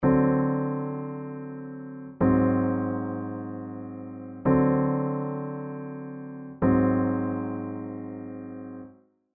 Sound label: Piano Tritone